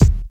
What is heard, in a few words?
90s rich high end rap steel kick drum E key